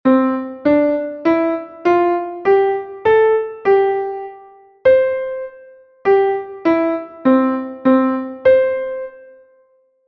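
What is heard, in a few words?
staccato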